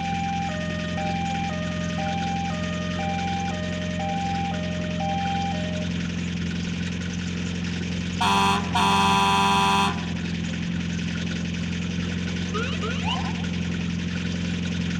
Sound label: taunt ambulance loop